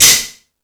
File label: Found Sound, E-MU Pro-Cussion